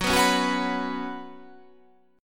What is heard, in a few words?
F sharp Suspended 4th